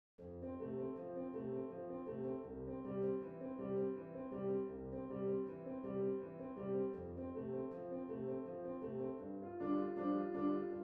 menuet quintette cordes; boccherini luigi

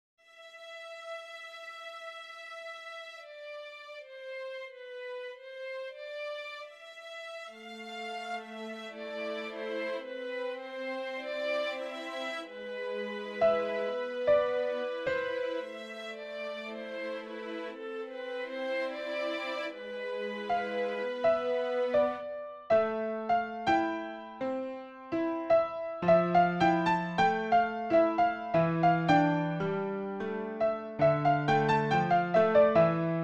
quatuor pour piano

